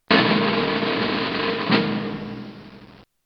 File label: drum